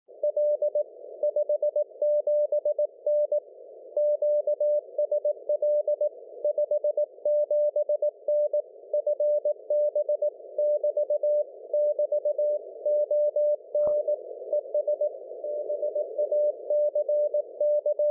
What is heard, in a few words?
CW, MF 472kHz; G4GTW